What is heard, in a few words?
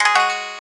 gayageum, keytone